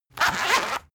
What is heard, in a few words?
zipper run long fast; objekte; reissverschluss